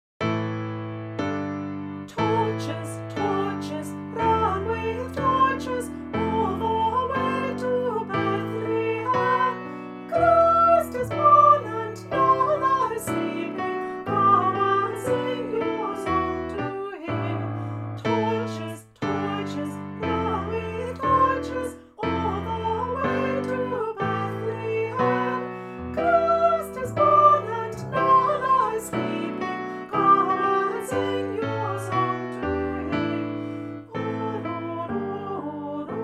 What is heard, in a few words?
torches vocal, choir; christmas past and present choir celebration 2024